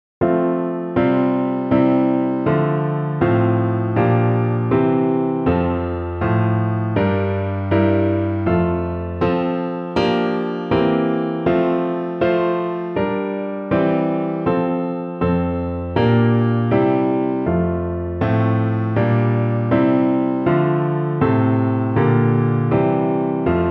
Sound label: piano